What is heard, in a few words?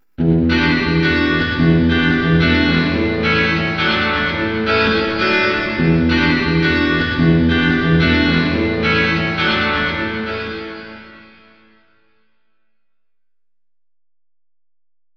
guitar Parking Garage